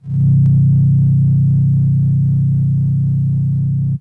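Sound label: DX100 D#1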